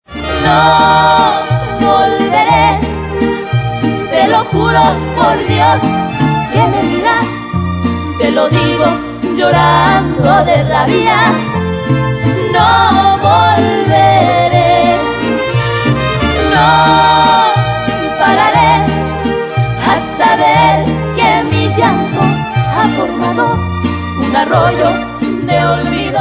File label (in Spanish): con mariachi